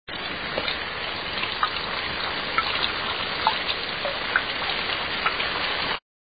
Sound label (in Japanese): amadare